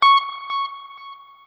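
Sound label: NOODLE SHORT, Guitar & Bass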